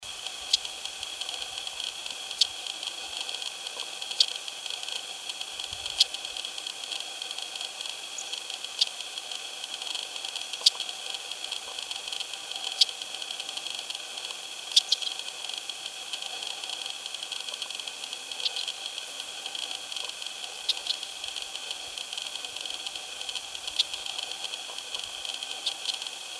Long tailed ground squirrel Urocitellus undulatus, Yarlu river; Dmitry Kukalov